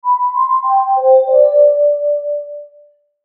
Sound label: Logo Soft Pad Ring High Melody, Logo Mallet Percussion High Twinkle Delay; Sonic Logo Sound Effects; Production Element Imaging Element Accent Transition